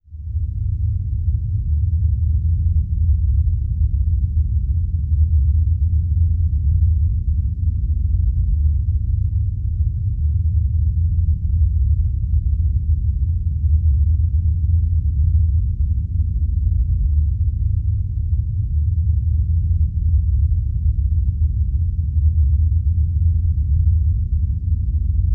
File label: Avalanche Distant